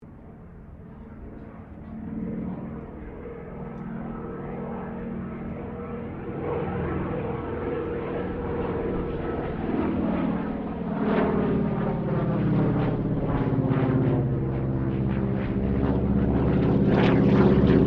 AIRCRAFT CAC CA-25 WINJEEL BARREL ROLL, fighter trainer; RAAF Winjeel fighter trainer; Airplanes